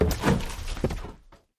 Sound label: popup dissappear; Fishing For Gold